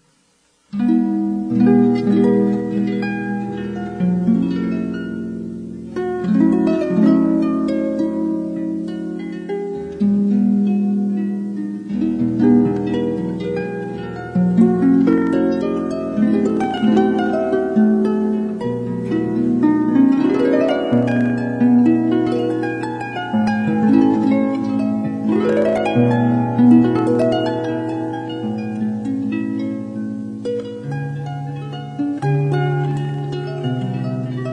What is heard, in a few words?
harp